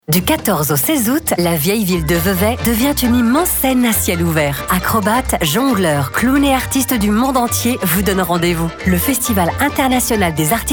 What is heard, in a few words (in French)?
spot classique